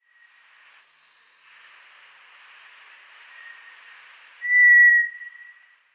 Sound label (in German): Erddrossel Zoothera dauma; Vogelstimmen